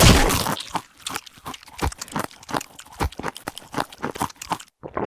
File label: Eating; @ Kankers; Ed Edd n Eddy; Adromulus Pack; EEnE